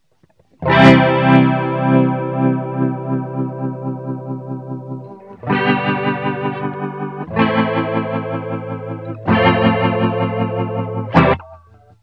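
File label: rotary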